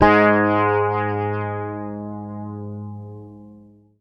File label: G#, Bass; Sampleheads; Will Lee Bass Library